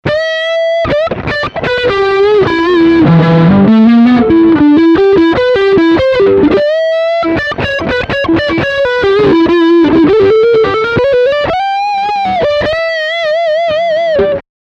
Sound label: Lick Front pickup